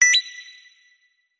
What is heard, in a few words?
Snes coins; gridtracker